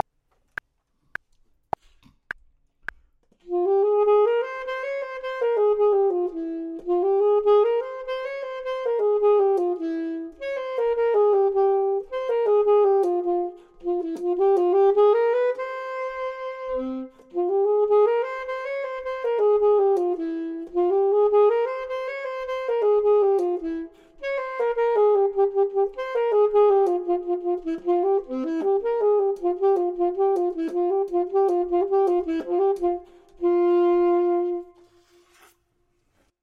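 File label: top, Saxophone and Clarinet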